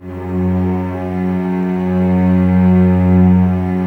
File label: Cellos F#2, String Ensembles, No Vib; Miroslav Vitous